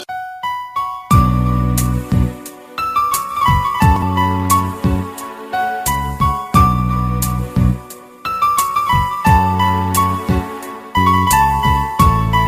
romantic piano music ringtone

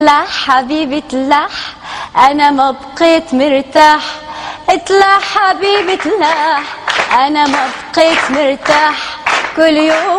cool song

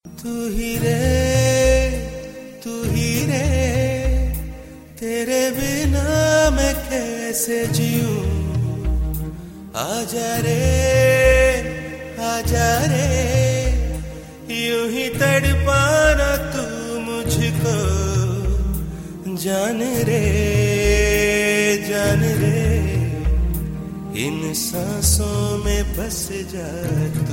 beautiful song ringtone; movie bombay

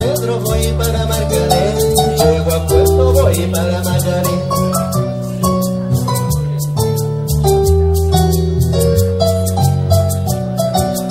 best colombian song ringtone

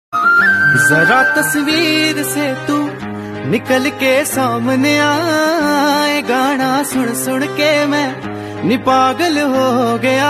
punjabi version ringtone

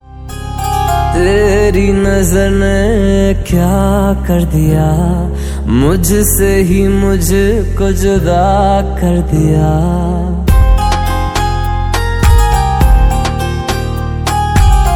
bollywood ringtone

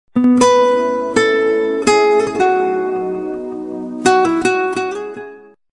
latin guitar

